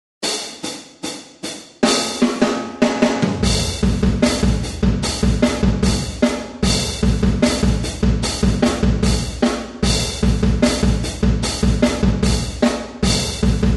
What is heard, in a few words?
song drums